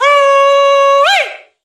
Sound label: Woooooweeeee